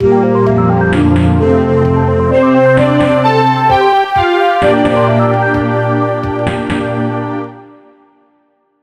level intro; Robot 64

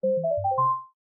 Computer Startup; Robot 64

